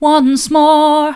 once more mid, vocal

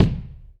Acou Kick, drum

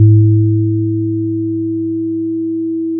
pop, Sound of a church bell

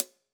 KHats Clsd

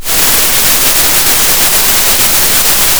Sound of a thunderstorm

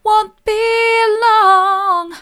wont be long mid, vocal

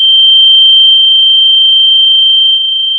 Sound of a tea kettle whistling

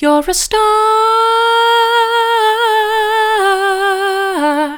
vocal

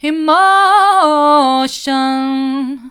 emotion mid, vocal

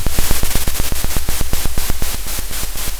pop, Sound of a drum roll

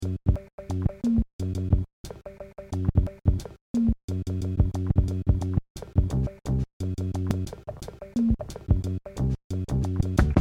long loop mono

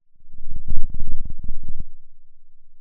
pop, Sound of a thunderstorm

